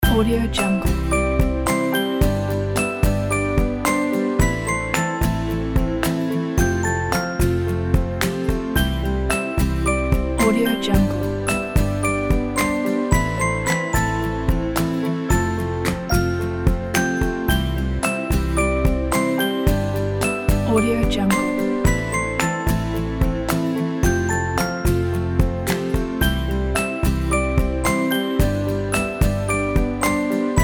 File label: upbeat background; diamuzy